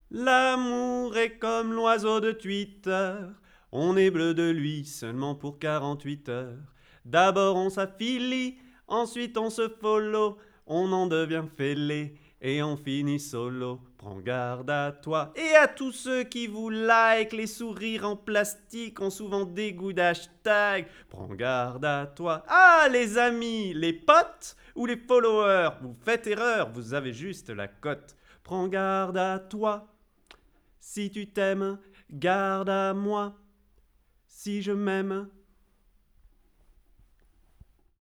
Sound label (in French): VOIX CARMEN